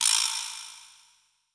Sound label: Vibraslap, percussion reverb; MARKYDRUMS